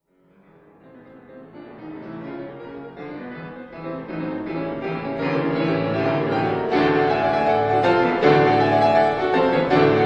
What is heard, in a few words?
Suite for Two Pianos Tarantella; Bowen; Op.111